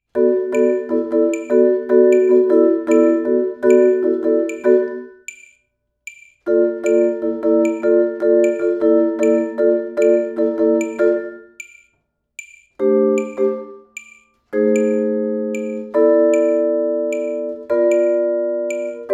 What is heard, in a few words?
chords, vibes